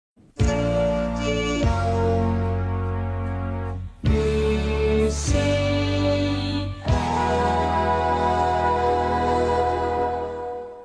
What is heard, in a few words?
logo; vcl